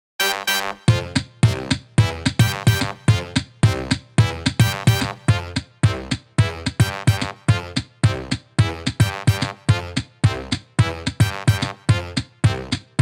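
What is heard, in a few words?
Instrumental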